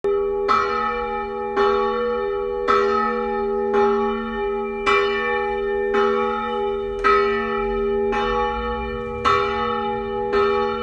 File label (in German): Geläut 12 Uhr; friedenskirche osterkirche